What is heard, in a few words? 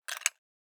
Metallic Gear; Survival Game Sounds - Lite Edition